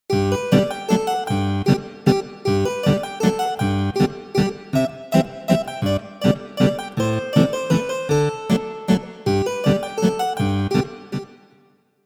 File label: Minuet in G Major; Anna Mag-Bach